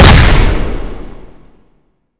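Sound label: explosion, weapons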